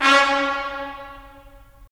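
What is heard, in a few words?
brass trumpets staccato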